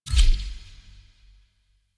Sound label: UI Default Theme Sweetener Two; Warframe